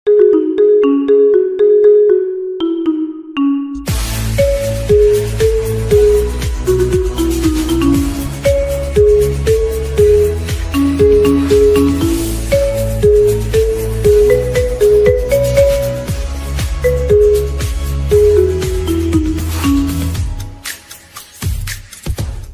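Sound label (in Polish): iPhone 14, dzwonki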